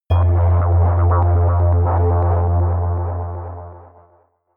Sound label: synth hit rhythmic scatter, hits impacts; whooshes rises